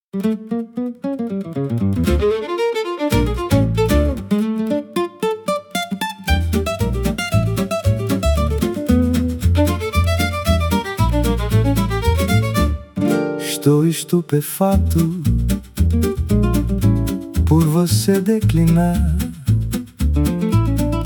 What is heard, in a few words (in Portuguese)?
Jazz Acustico